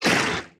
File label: wriggler attack; enemies; Factorio